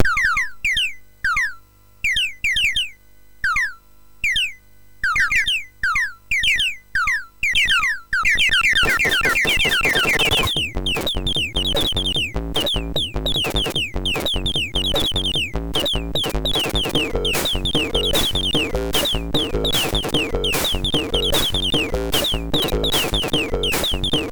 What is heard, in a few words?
Bird Song, MOS6581R3; hvsc